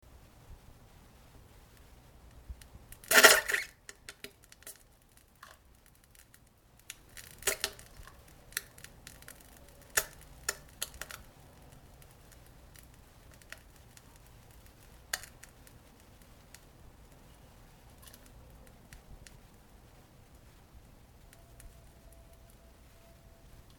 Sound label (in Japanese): なんべんおなら ぷぷりぷむちゃちゃ音